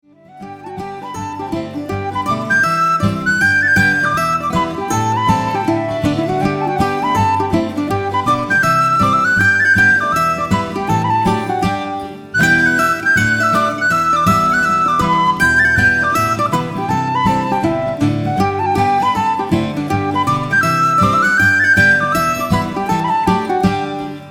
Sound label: hornpipe